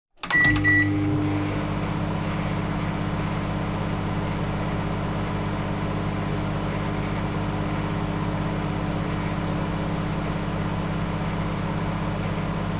household microwave run full power 1 min beeps at end